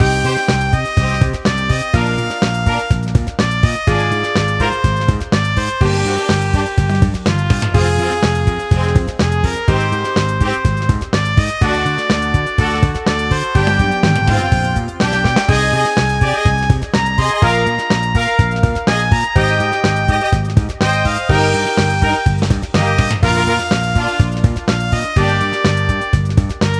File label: BGM; Heroland